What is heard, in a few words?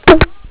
BASH